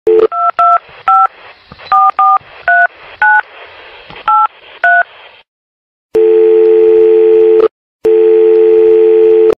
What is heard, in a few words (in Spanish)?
sonido de celular o telefono para marcar; REPASSEM MATEMÀTIQUES P-5